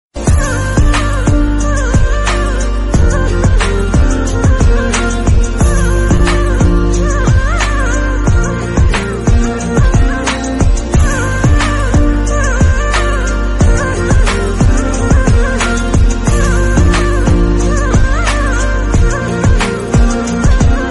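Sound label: Music From East Nepal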